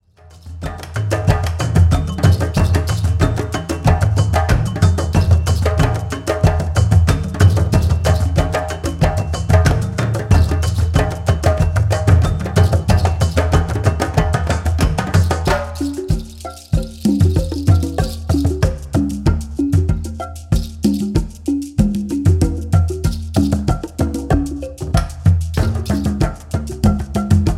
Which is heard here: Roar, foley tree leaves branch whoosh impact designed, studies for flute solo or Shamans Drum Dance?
Shamans Drum Dance